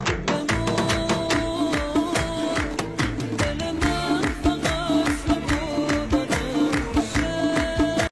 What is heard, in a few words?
Remix Beat Kordi; Remix Jadid